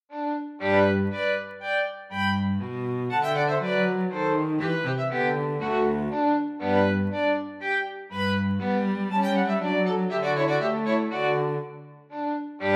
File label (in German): Menuette fur zwei violinen und Bas Menuett; sechs Menuette